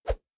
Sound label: cards throw away; keezers